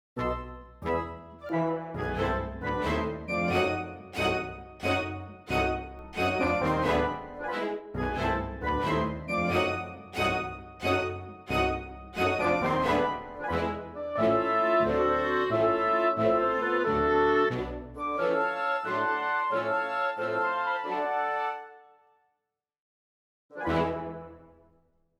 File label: Fight No Brass; Ropasci Forest